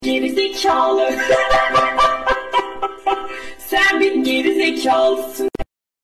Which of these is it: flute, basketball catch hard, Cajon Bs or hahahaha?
hahahaha